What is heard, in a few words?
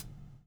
BUBL, Backbeat; Spectrasonics - Backbeat